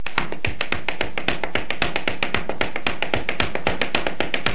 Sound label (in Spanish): musical